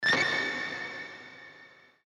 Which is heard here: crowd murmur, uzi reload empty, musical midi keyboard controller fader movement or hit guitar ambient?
hit guitar ambient